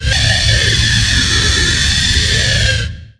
weapon speed

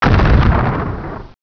exp, weapons; Hexen2

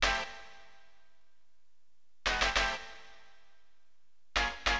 STAB, AcidJazz Funk Fx; LiveAct Pool

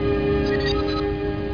DOORBELL

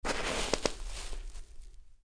player snow

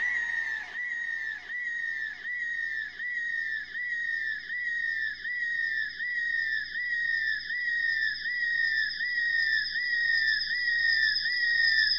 AMBNT 160; eJay; Ambient

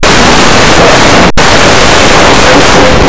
can; Blue Heat

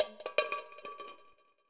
can; KINGPIN

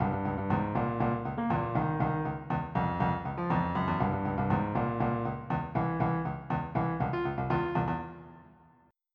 Funk

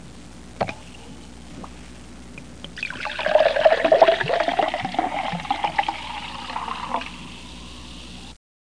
pour

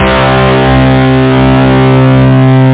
MET CHORD; Groovy Bytes: Behind the Moon

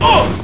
Get hit

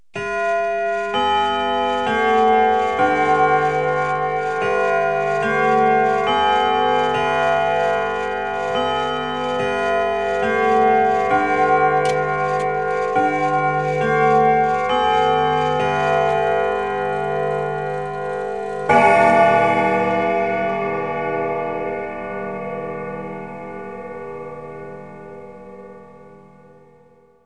Westminster, Chimes; Household; 1,000 Sounds (16-bit)

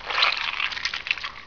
Seaweed Squish; Victor Vector & Yondo, The - The Cyberplasm Formula

